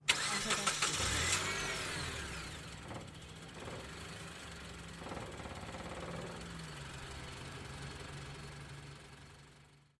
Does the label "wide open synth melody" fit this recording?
No